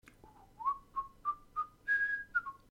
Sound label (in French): marseillaise